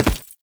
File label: Step Default, Foley, Footsteps; Warframe; Lasrian; Lasrian Soldiers; Lasrian Butcher